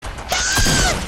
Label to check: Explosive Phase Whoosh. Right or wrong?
Wrong